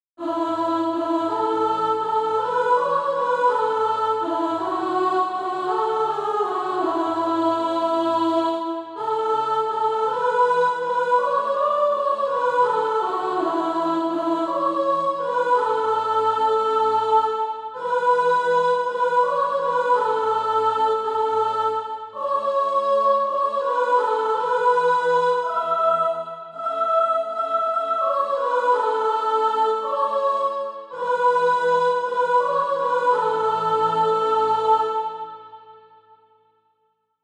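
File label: soprano, Practise Music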